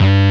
DIST SB2 G-2, STD SYN BASS; AKAI S-Series CD-ROM Sound Library VOL-7

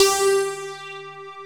SYN G5; Best Service Gigapack 1

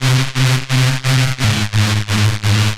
Dutter Filter Bass, Drum n Bass; KJ Sawka; Drum n Bass & Beyond

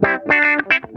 DIZZY, Funk Guitar; 90 s Sample CDs; Zero G

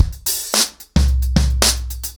110BPM, acoustic drums, drumloops

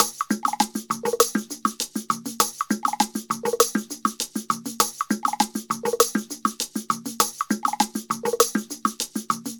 Ensemble, Latin Percussion

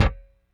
Rickenbacker Bass; Airwindows; Rick-Xtras

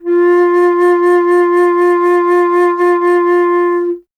Alto Flute, Solo Instruments; Miroslav Vitous - Solo Instruments VOL-2

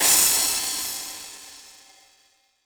Lofi Crash, Drum Hits; Zero-G; Soundclash

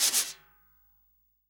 SD, REAL DRUMS, SWING KIT; Best Service ProSamples vol.43; Real Drum Kits

